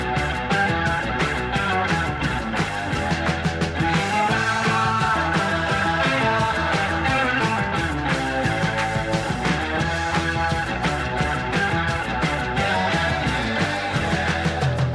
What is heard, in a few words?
elephant stomp rock & roll drummer